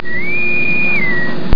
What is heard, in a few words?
intercom